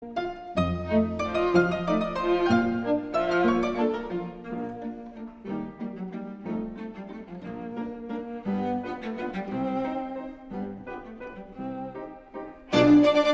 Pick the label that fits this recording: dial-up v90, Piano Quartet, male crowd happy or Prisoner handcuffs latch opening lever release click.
Piano Quartet